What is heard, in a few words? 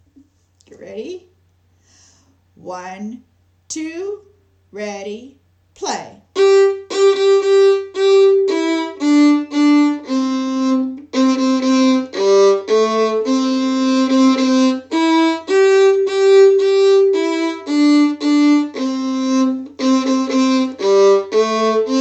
Sail Away Key of C